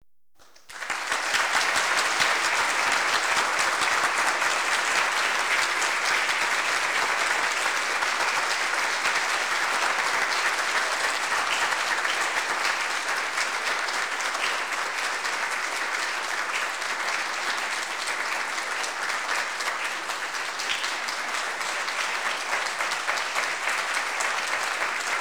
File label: Audience Applause